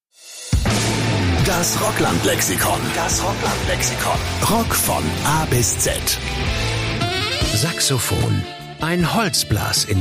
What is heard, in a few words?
saxophone; rockland lexikon